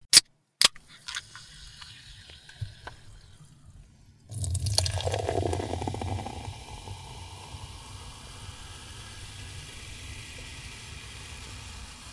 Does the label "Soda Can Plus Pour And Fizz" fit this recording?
Yes